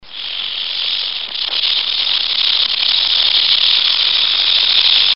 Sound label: Rattlesnake rattle